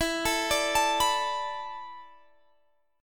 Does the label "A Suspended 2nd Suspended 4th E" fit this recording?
Yes